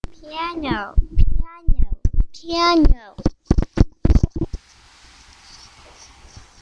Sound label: piano